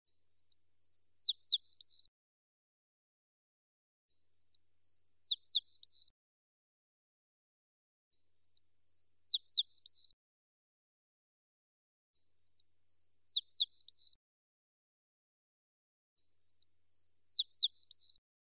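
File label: clear singing of sparrow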